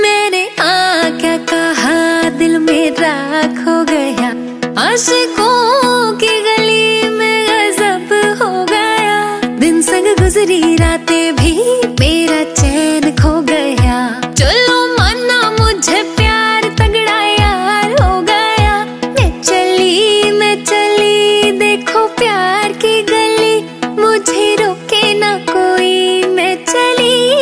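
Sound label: Romantic Hindi Female Song Ringtone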